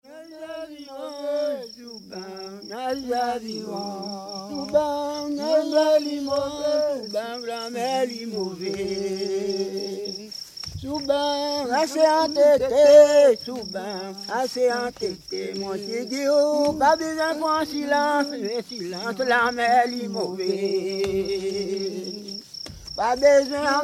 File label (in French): Chant des pirogiers